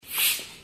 air pump

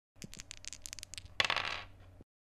dice Roll Sound

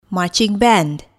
marching band

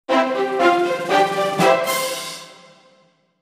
game level completed; mixkit

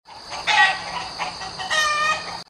chicken Sound

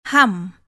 hum

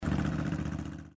suzuki drive by

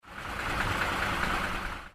truck idling

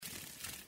butterfly wings sound effect

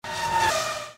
fast cut

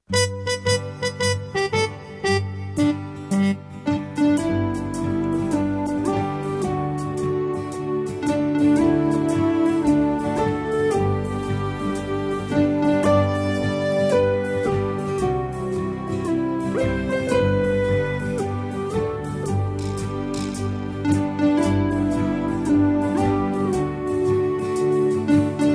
Happy Birthday To You Instrumental

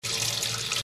water sink

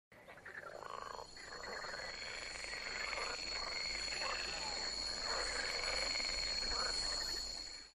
jurassic jungle

